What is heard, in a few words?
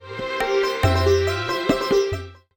notify sitar